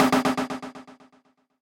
Strike Slip, percussive; Ableton; Skitter and Step

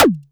doosh; Futura; Ableton Foundation FreePacks; Wicked Kits

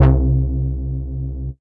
Bass; Ableton; Factory Packs; Build and Drop

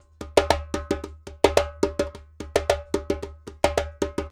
111 African Djembe; World; African Percussion

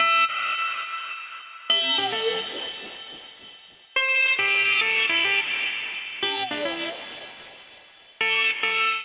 Itchy Lead Am [106 Bpm]

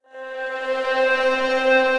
Choral Spectrum; Disembodied Voices

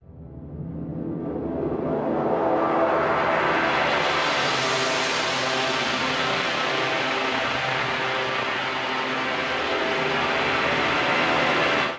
Texture C 80 Bpm; MIDI Clips; Instruments; Golden Gate; Ableton; Drone Lab

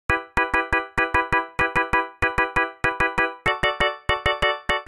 E min 88bpm; MIDI Clips; Accompaniment; Ableton; Chop and Swing

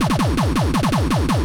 164 Techno Kick; Dance DJ; Drums

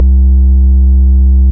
Sub Bass, Sampler; Ableton; Samplification; My Sub Bass

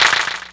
Clapbox Pitch16; Ableton Foundation FreePacks

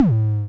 Tom Fire, drum machines; Drum Hits

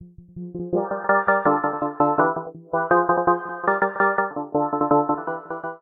G Minor 165 bpm, pads; MIDI Clips; Tonal; Eidolon; Skitter and Step; Ableton Factory Packs

